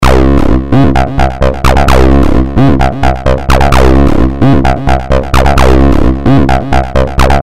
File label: 303 Bass; Sony NY Dance